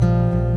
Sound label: Classical